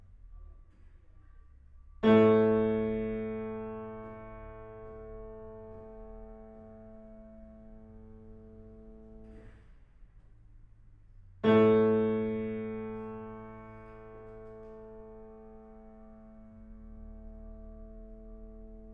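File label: piano room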